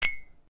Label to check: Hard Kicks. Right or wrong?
Wrong